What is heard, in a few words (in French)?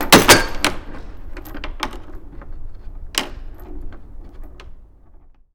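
Chaîne Métallique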